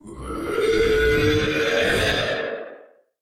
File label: Mega Beast Howl